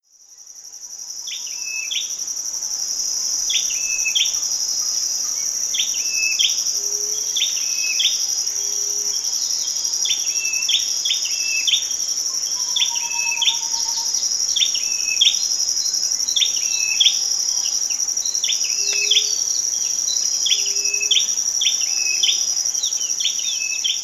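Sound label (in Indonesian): cinenen kelabu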